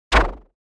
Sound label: Hit Land